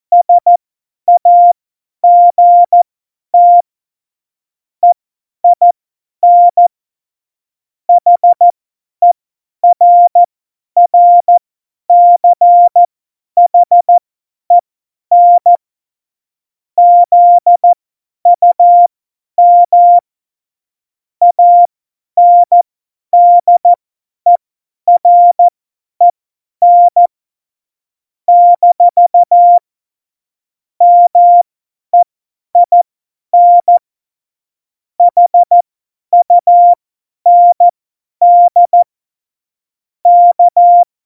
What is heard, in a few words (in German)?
Witz, Morsekurs, 50 F70; Witze